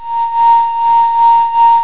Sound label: GLASS RIM